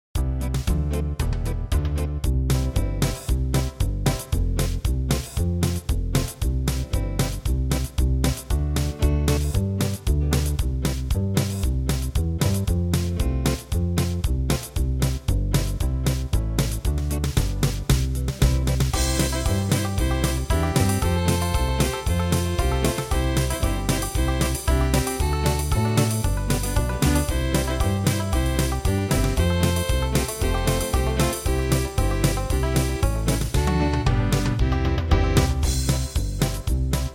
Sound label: east tennessee blues fast